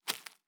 Leaf Walk Surface, Footsteps

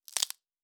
Bone; Survival Game Sounds - Lite Edition; Combat and Hunting

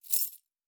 Keys; Survival Game Sounds - Lite Edition; Doors

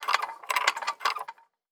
Metallic Gear

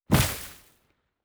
Tree Falling; Survival Game Sounds - Lite Edition; Environment

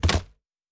Build Interface; Survival Game Sounds - Lite Edition

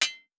Blacksmithing; Survival Game Sounds - Lite Edition; Crafting

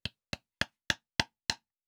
Nail Wood, Crafting

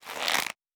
Rope; Survival Game Sounds - Lite Edition

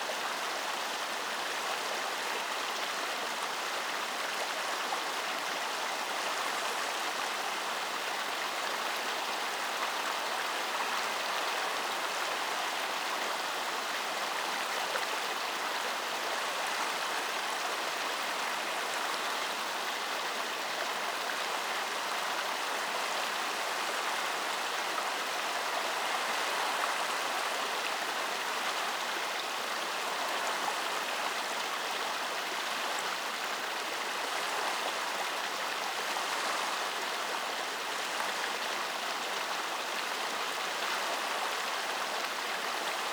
Water Stream Strong; Survival Game Sounds - Lite Edition; Environment

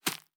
Leaf Run Surface, Footsteps

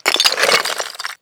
Shatter, Stone; NV3D

